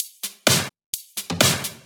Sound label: Electro Loop 128 BPM; Vengeance Samples